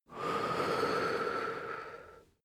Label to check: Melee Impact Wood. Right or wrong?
Wrong